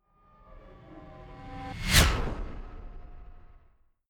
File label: fly by hover car hum high